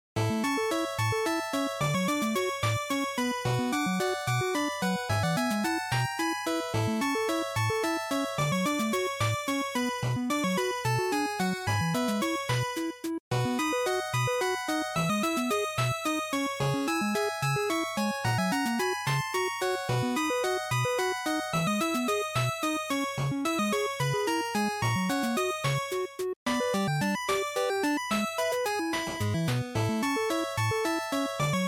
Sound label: Action Ending, bgm